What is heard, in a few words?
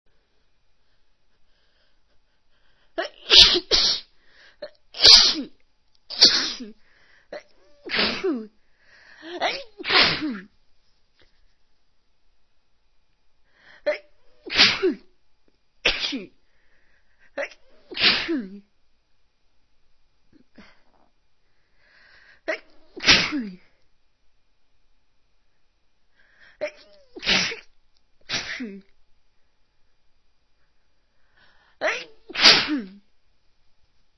allergies, female